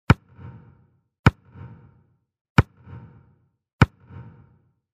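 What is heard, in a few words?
ball bouncing on asphalt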